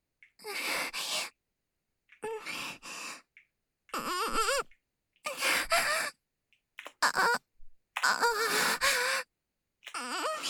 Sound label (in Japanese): 喘ぎ（SEあり）喘息